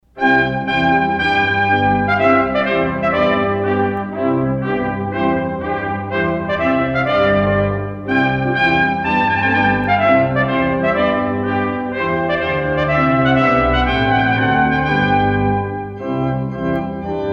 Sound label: Trumpet Tune Solo Trumpet and Organ